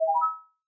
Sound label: match